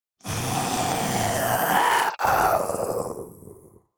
Demon beast imp monster groan death

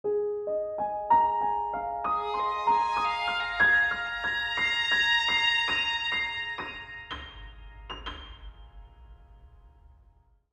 Video game success victory win high piano

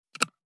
Camping flashlight switch button

